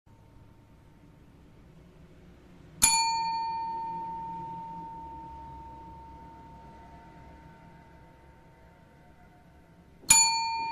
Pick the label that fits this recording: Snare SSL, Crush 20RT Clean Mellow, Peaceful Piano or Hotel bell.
Hotel bell